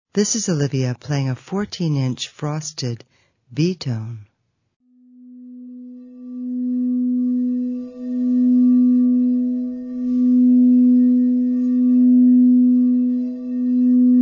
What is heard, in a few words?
b tone